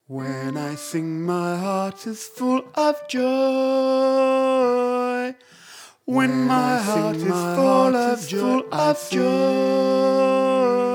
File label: When I Sing - satb; Canon